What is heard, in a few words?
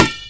dodgeball hit